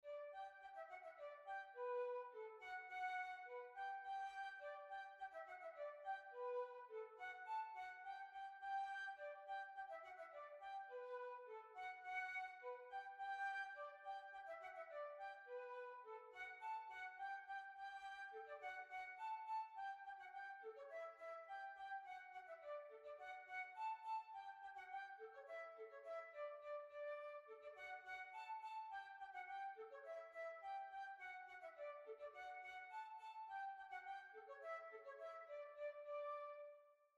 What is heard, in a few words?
Polka